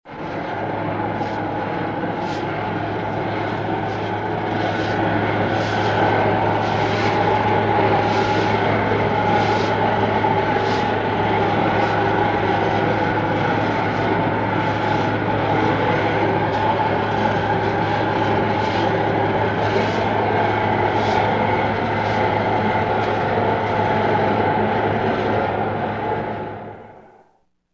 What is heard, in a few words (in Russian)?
gorenie ogromnogo fakela